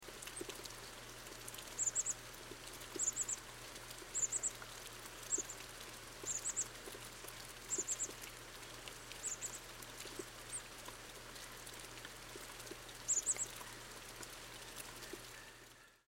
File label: chestnut-backed chickadee